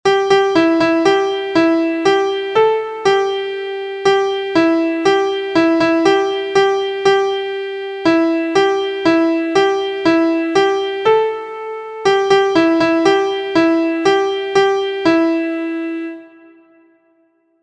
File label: MIDI